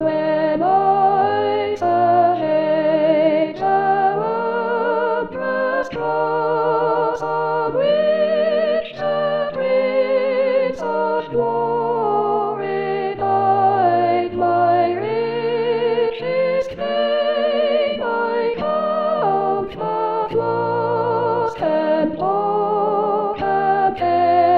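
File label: When I survey Soprano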